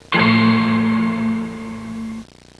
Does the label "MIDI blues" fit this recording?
No